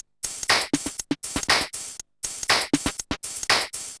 BEAT, DANCE DRUMS; MAGIX

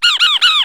BIRD

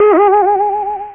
boing, cartoons

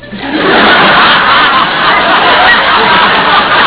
laughter

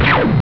galactica laser; alienfrenzy

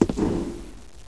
STEP; PLAYER

